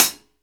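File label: MIX closed hi hat, 14 INCH OLD HI HAT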